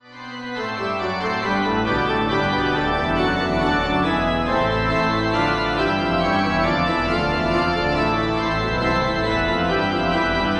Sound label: Renaissance Pipe Organ; Organux; Syntheway